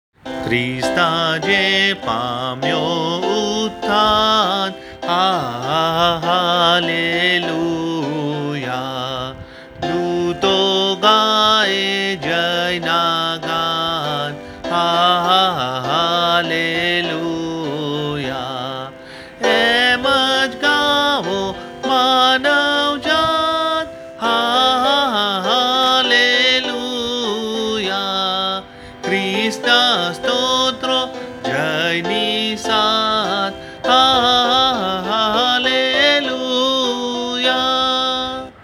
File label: Easter Hymn